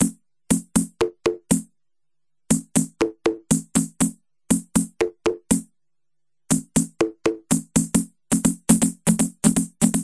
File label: drum, yamaha tx81z; old drum